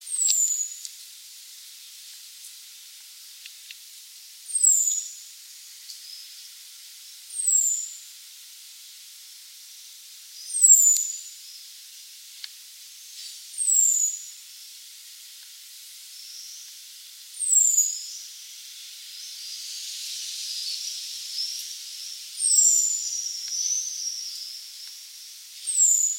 European Robin, Rotkehlchen

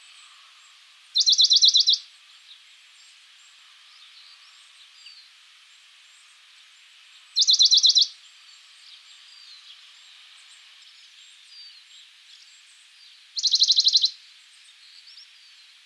Western Bonelli's Warbler, Berglaubsänger

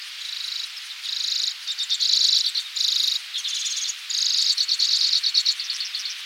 Lesser Redpoll, Alpenbirkenzeisig

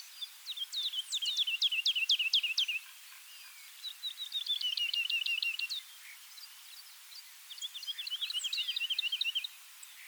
Woodlark - song, Heidelerche

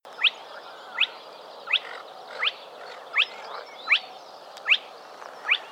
Spotted Crake, Tüpfelsumpfhuhn

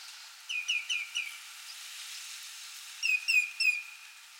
Common Greenshank, Grünschenkel

